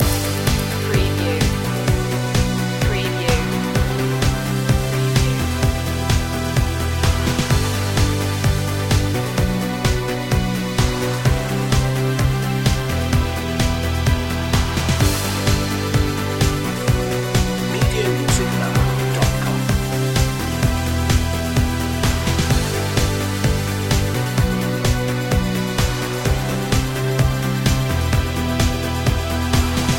loop, Emotional and driving synth-pop track. Uplifting synth; electropop